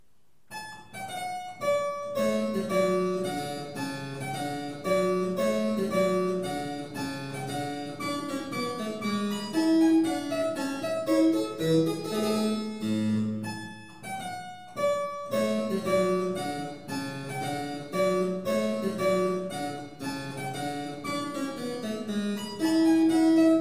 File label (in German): Cembalo; mozart